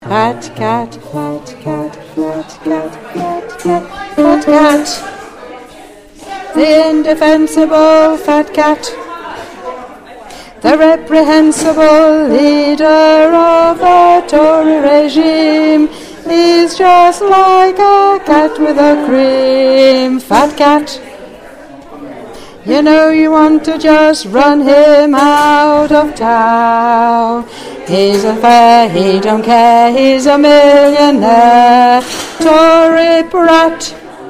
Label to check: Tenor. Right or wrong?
Right